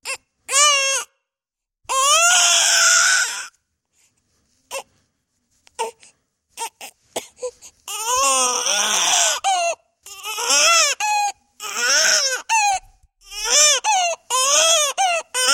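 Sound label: sounds of newborn crying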